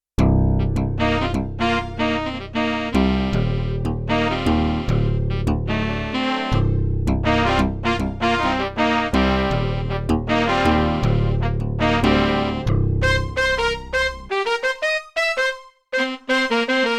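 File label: Funky Section brass; Yamaha TX16W; Orchestral Performance 2; Funky Section-MWbras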